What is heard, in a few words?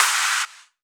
Claps; Vengeance Samples